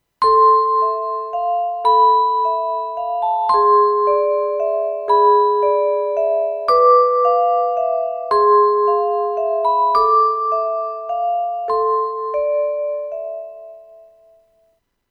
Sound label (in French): Boite a musique